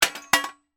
industrial hand cart fall over on concrete surface; sound effects one